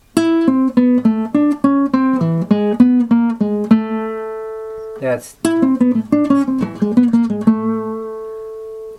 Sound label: jazz licks